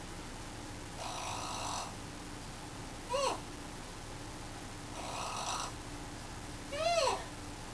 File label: snoring